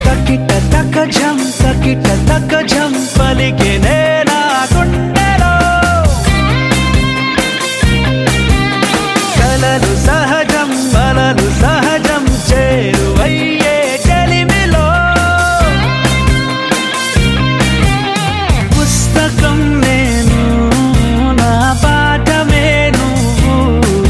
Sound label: rock telugu ringtone